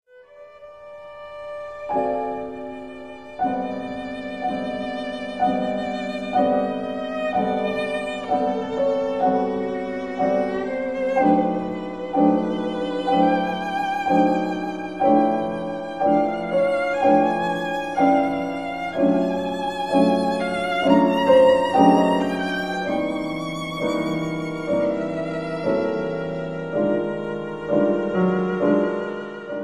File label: Trio for piano, violin & cello Adagio, teneramente